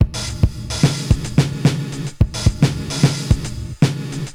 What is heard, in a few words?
80s Gated Verb Korg MR-16 (110BPM), cyborg beats